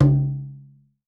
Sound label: Conga - C#; Percussion; Cymatics - Infinity Beta Pack 2.0; Zeus Percussion Collection